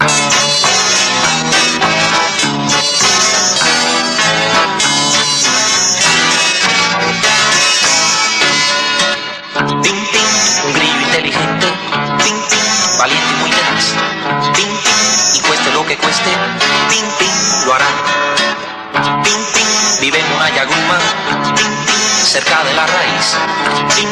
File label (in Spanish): Cancion